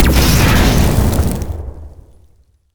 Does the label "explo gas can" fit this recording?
Yes